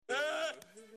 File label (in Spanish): risa nerd